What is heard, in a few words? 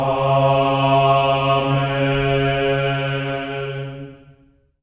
amen; Spectrasonics; Symphony of Voices; GR AMENS